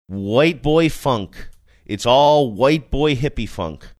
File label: white boy funk